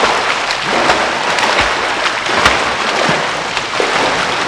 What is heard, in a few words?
splash